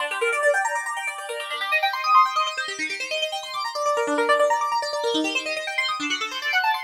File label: Arp 140bpm G, Trance